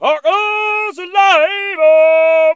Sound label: Market, Ambient; PC Gamer IT CD 46